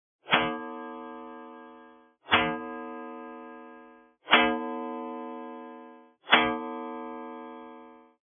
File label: Necklace Sound Design DRY